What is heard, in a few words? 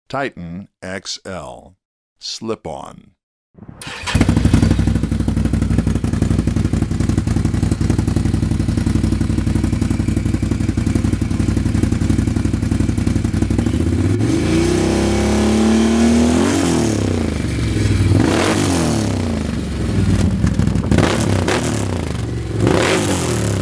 can am outlander 1000 xmr